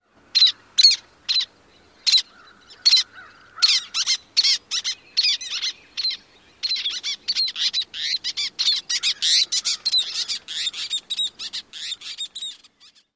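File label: Least Tern, typical shrill calls number 2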